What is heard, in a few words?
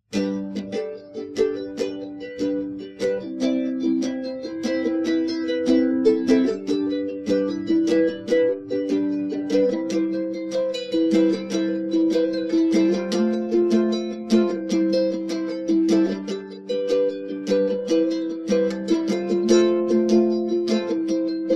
rambling uke